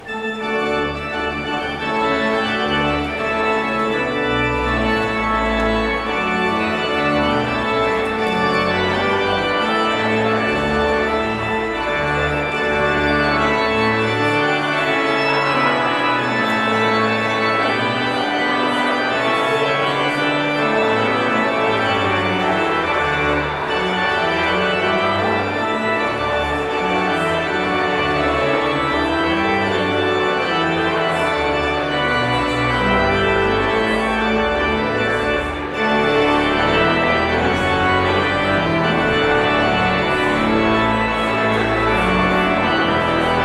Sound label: Postlude